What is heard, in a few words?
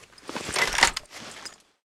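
Weapon rifle gauss jam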